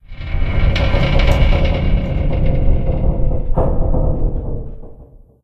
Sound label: Metal Stress; silent hill homecoming 2008 gamerip pc ps3 x360